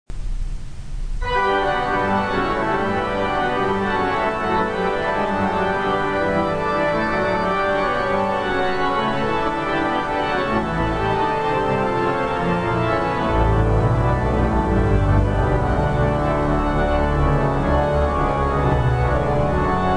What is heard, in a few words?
organs; Mauer; Dupre